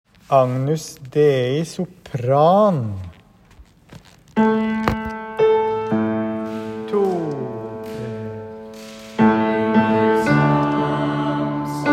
melodi; agnus dei; nedre eiker; kirkekoret

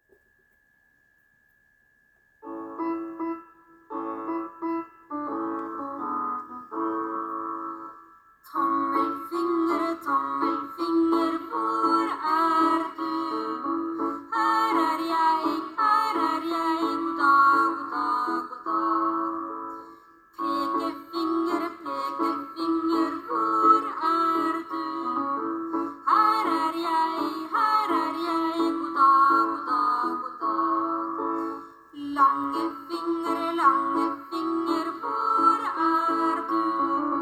babysang; trysil; tommelfinger

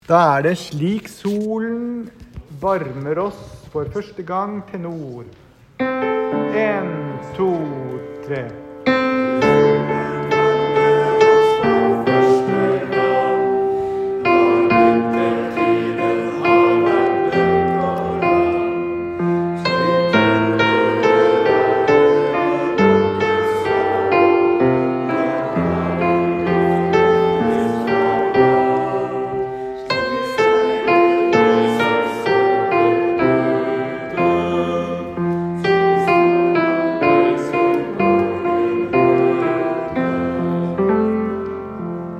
tenor; kirkekoret; salmekveld

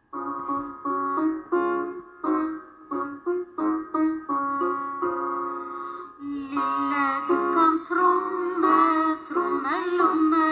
lillegutt kan tromme, babysang; trysil

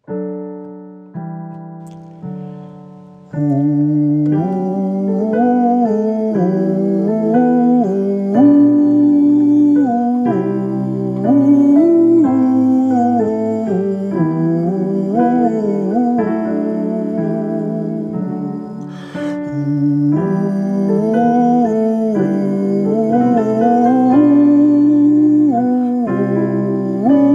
salme; sommerro; nedre eiker; kirkekoret

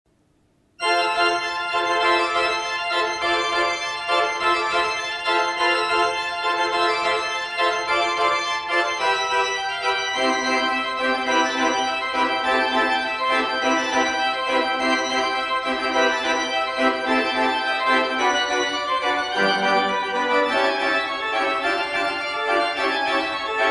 toccata; widor; vestre toten

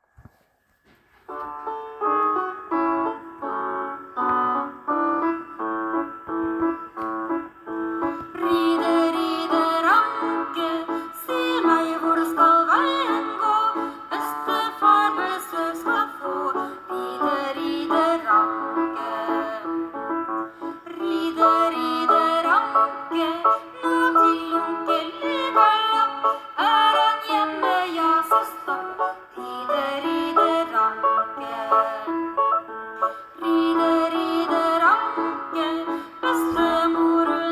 ride ride ranke, babysang; trysil

